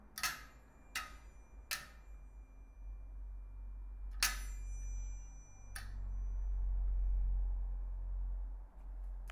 Hanger Clangs

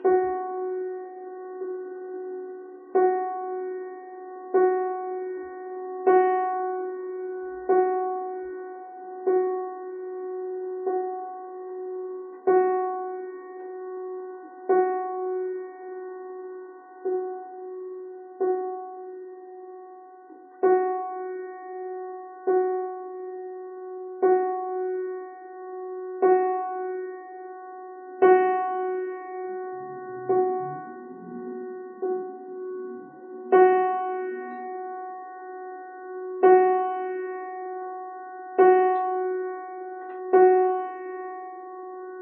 Piano Note

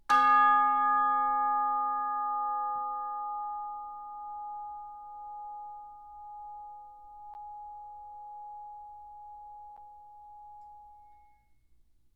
Chimes G 3 F

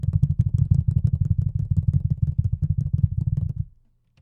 Stomping

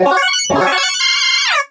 Pokemon Cry Parody

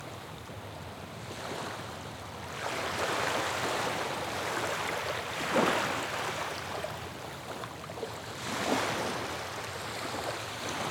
Calm Waves Sandy Coast

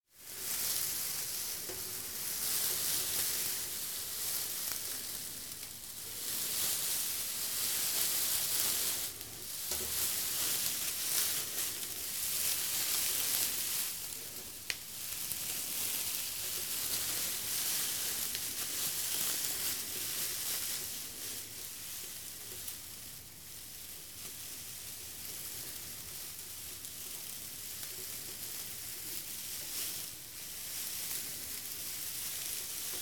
Hay, ambience

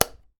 Light Switch Off

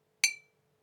Bottle Tap

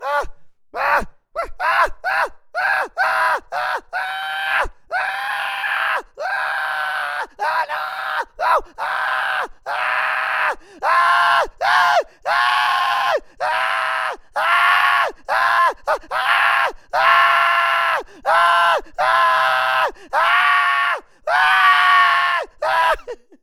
Man Screaming Extended

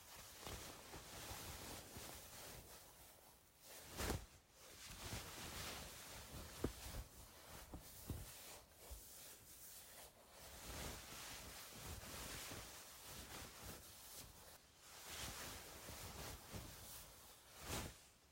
Fabric Clothes Rustling

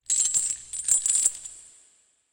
Chain wrapping Reverb

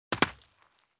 Landing On The Ground

